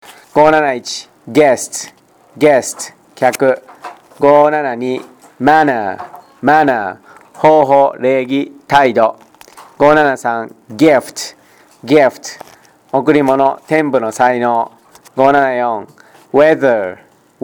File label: tango